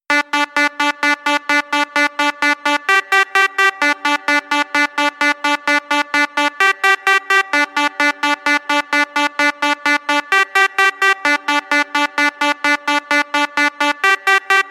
Samsung Galaxy Notification Ringtone

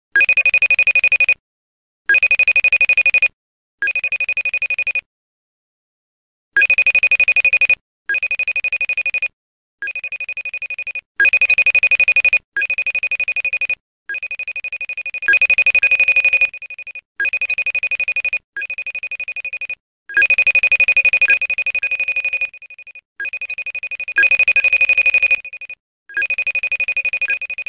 Face Time Call

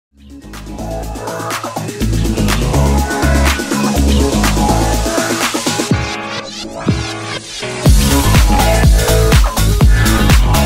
Best Instrumental

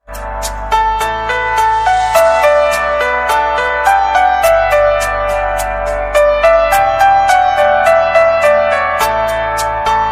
Piano Music Ringtone

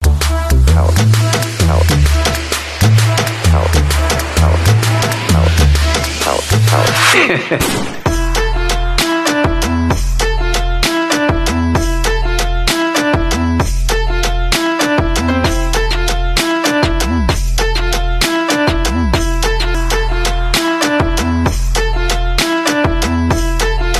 Tik Tok Background Music Ringtone

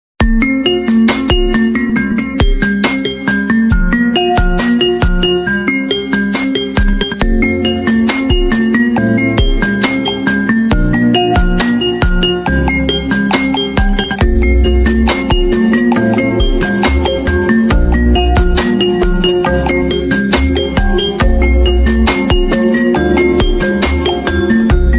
Xiaomi Redmi Note 12 Ringtone

